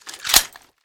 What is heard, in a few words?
clip in, desert eagle; weapons